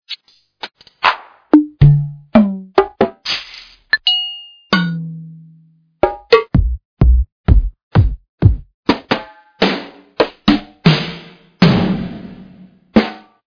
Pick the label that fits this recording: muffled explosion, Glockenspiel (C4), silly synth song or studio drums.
studio drums